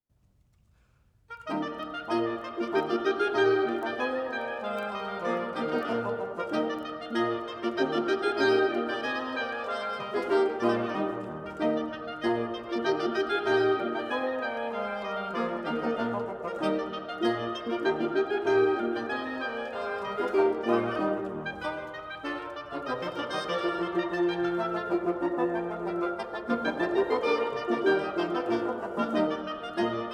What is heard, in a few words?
Divertimento No. 12 for 2 oboes, 2 bassoons & 2 horns in E flat major; Mozart